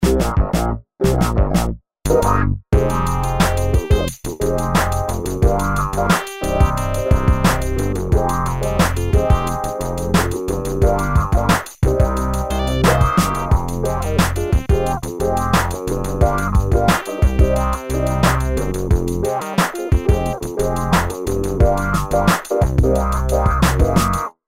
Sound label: Funk Old School, hohner; hohner collection; Pianoteq 8